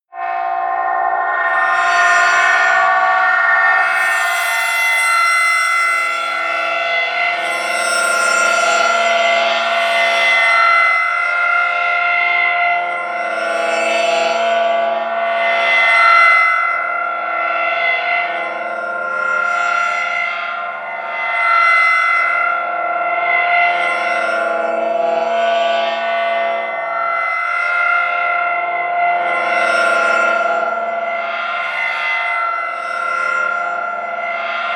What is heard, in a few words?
atmosphere wandering scrapes, Horror